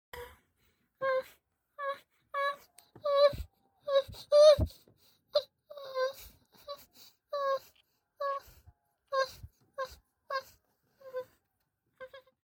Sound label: hehe